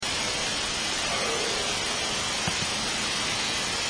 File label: Weird Howl In Basement